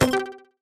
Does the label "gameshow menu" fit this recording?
Yes